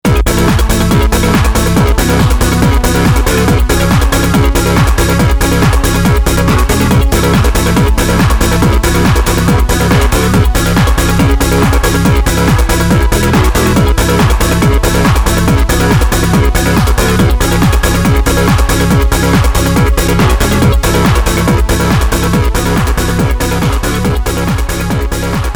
Trance

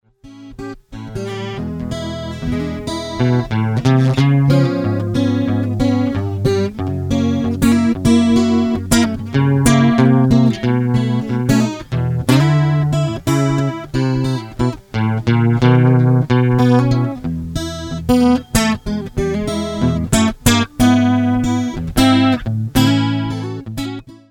acoustic

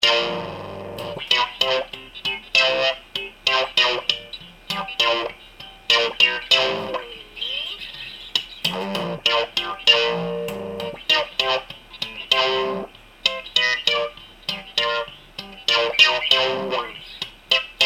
New Tone Tube Zipper

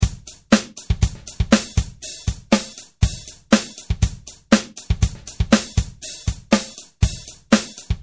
Stiff Steely

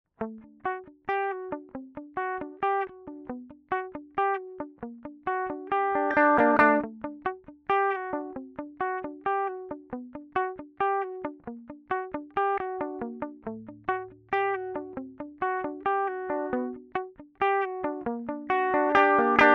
80s heavy

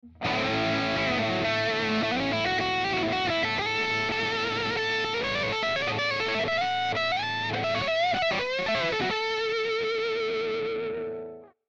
Elec Gtr Preflex Crunchy

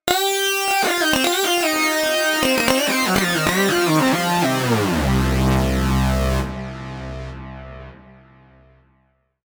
Lead

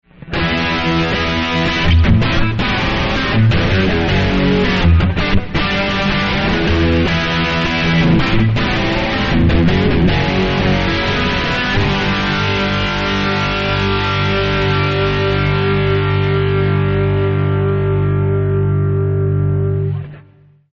flangitis

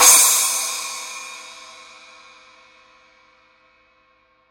splash edge

